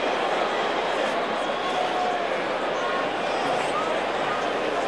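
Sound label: Hockey Crowd Ambience Loop; Crowds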